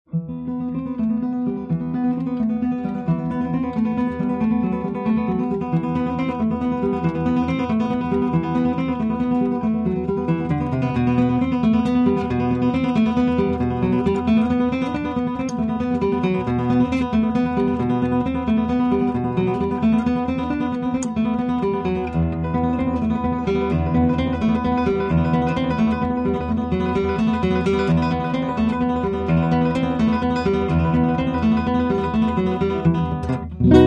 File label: Cedar, guitars; Albeniz; Asturias